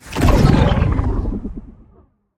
Ball Launch

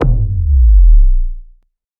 Goal Score Bass Drop

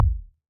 Ball Collision Geo Tackleball Light

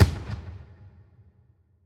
Celebration Fireworks

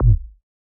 Ball Collision Forcefield Extra Light

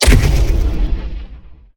Grenade Impulse Explosion

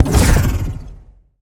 Center Ring Ball Launch

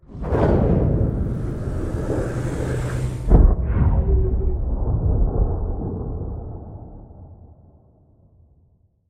Portal Closing; Skybox Local

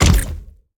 Pulse Wrench Hit Ground Other

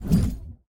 Hand Paddle Release Hard

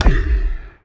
BallHit Hard Breathy; Spinal Serve

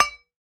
Ball Collision Holiday Mug Med

